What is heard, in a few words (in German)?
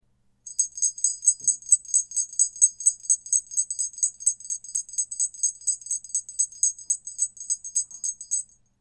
ind kreuz 18mm